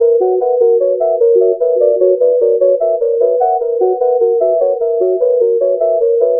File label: Flutey Low Cycle, Synthetic Pulses